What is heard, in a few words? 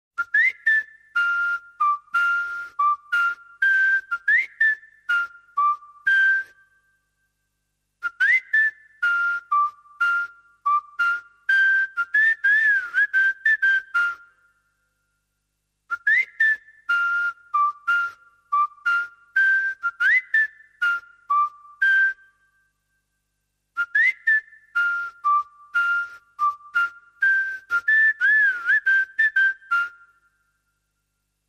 Whistle Only